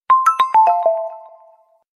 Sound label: Samsung Galaxy A03s Message Ringtone